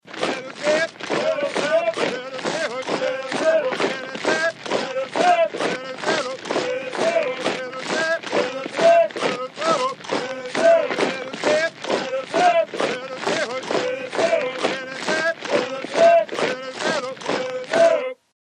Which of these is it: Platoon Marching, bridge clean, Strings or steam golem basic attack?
Platoon Marching